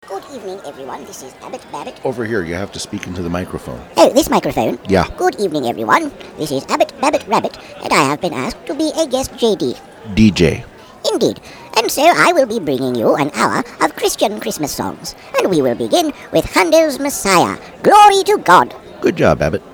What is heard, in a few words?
chritmas songs